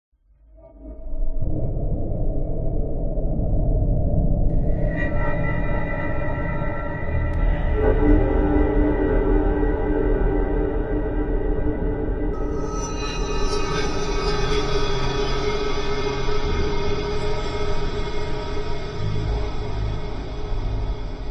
horror drone atmos dark evil malevolent sinister; zapsplat

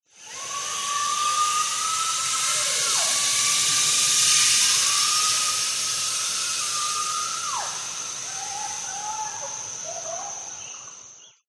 zip line through jungle person scream thailand

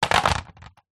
industrial power drill place down on ground

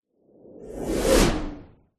magic spell whoosh powerful energy pass electric

